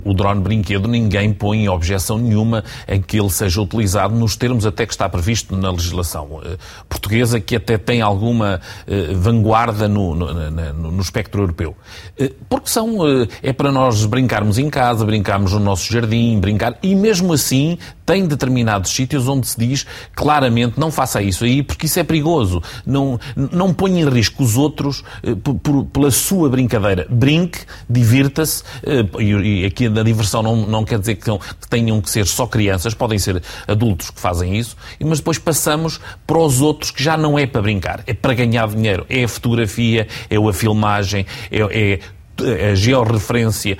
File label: Drone